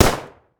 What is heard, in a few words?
Glock Shot; rebel cops 2019 gamerip; Tactics